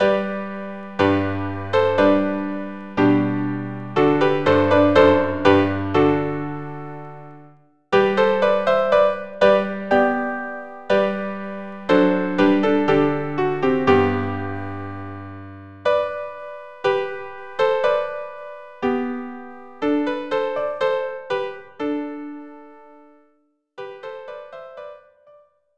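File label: string quartet; See the Conquering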